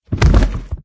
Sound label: death bodyfall girl; smite; scylla